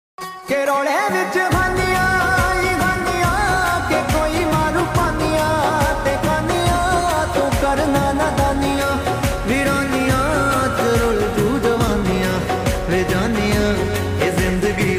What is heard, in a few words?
ringtone punjabi ringtone song punjabi